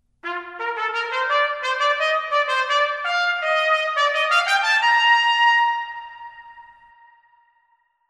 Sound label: Fanfare